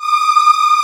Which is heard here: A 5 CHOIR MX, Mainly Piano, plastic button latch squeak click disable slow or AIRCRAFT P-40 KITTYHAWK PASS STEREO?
A 5 CHOIR MX